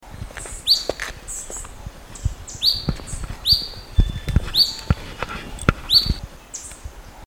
La Sagras Flycatcher; Soroa

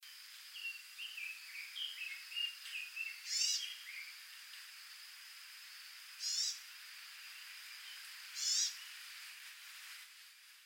Oahu Amakihi call

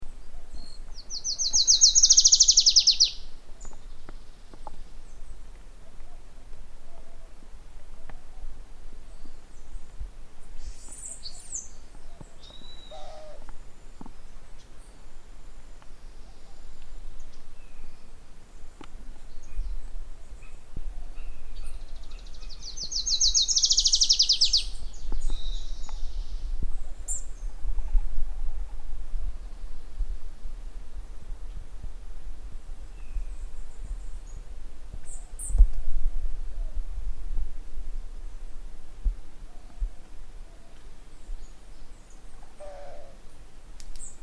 Adelaides Warbler song; US; Guanica SF

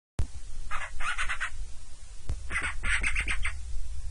Hawaiian Band rumped Storm Petrel ake ake

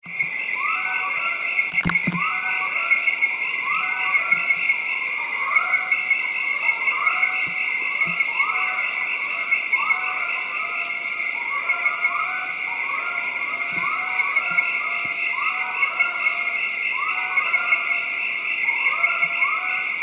Afropavo congensis

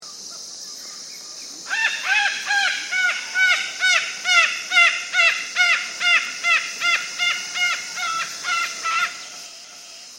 Cacatua ophthalmica

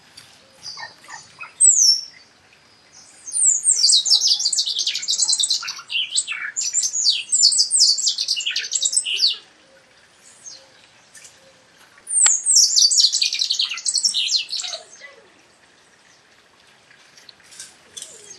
Chupim Molothrus bonariensis, CARAIBAS

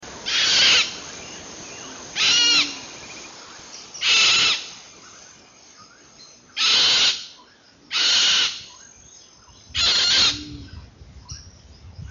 Cacatua goffini

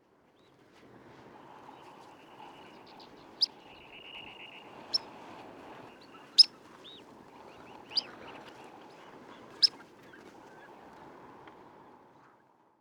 Red Phalarope Phalaropus fulicarius

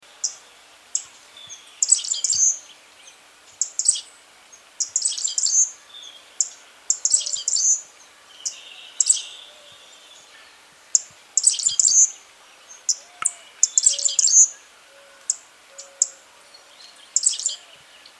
Cuban Grassquit Tiaris canorus

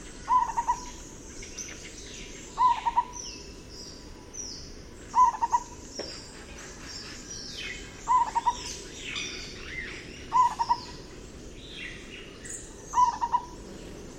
Zebra Dove song; LaDigue

